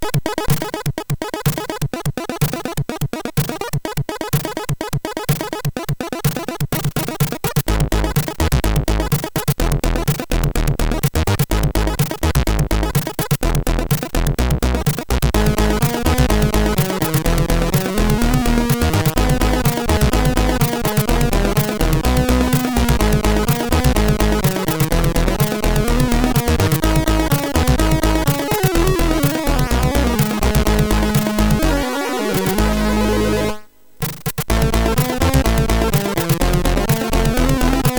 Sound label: music, sid, MOS6581R3